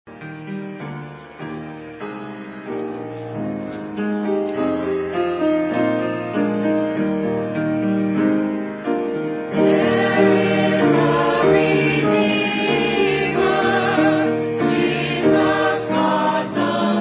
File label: choir